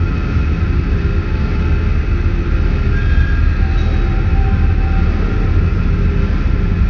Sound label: industria; opentdm